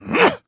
throw

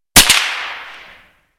Inf Weapons; Red Orchestra 41-45

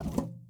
ui pickup wood med; Rust

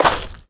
gravel run

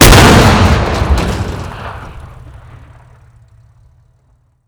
Projectile Sounds; Red Orchestra 41-45

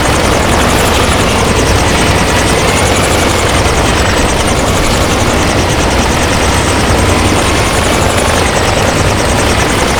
helicopter damaged; veh helicopter damaged

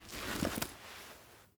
pickaxe deploy; Rust

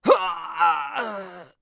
death; Escape from Tarkov

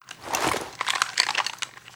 cliff locker fight; Call of Duty Modern Warfare

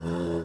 Horse Fort Vox; Civ4 warlords

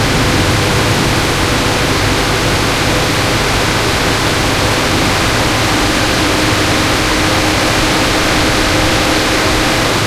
sherman m4a1 engine int rpm 99, engines tanks; War Thunder

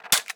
clip slap; Rust

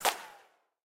bullet ricochet

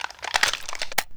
reload lift, foley; ModernWarfare

